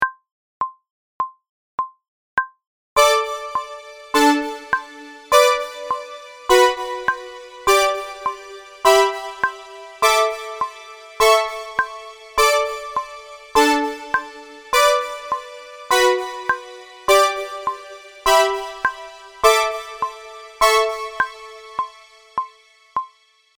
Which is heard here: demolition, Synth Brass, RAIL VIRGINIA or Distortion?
Synth Brass